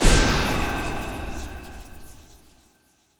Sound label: Hero Fireball